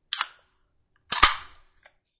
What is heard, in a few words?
Opening a soda can; Drink Mixing; Bars